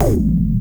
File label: Low Dip, Lounge Single Drum Hitz